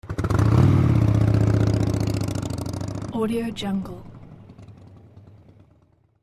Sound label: motorcycle starts